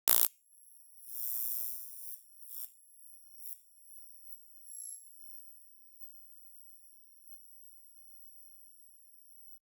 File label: electric tap a very short